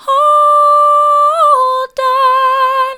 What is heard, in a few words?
hold on soft, vocal